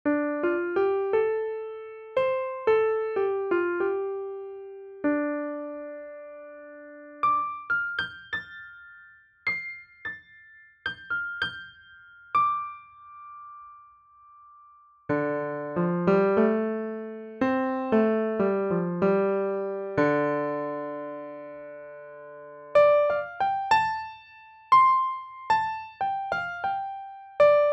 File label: Player trial - Right-shifted Cardioid Mics